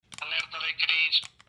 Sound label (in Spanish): alerta de cringe